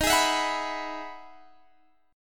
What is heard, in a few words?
E Major 9th Eb, chord